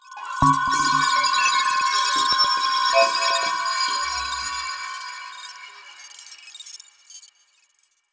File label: relic activation; isolated contingency core; Stellaris; ancient relics